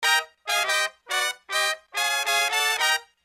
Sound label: brass loop